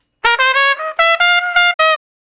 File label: Trumpet Shuffle (Bb); Solos; Crimson Blue and Fabulous Horncraft 4 RnB; Horncraft for R&B